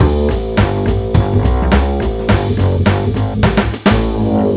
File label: land theme loop; Nokia; 5800 XpressMusic; Bounce Touch